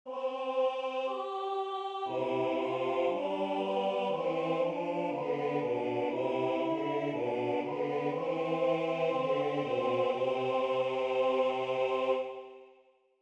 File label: Canon; ROUND